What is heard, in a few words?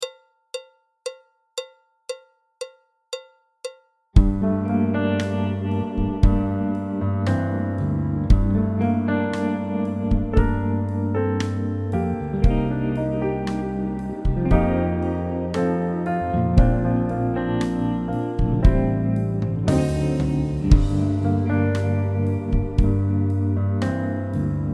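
backing track, Jazz; clarinet, flute, trombone, piano; Jazz Syllabus Level 2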